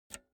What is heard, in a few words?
object electronics pull out jack multi socket quick; smartsoundfx